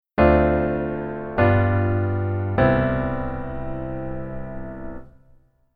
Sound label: keyboard voicing low